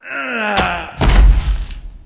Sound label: Mob die; SubBossmob; 욱일승천류; 가토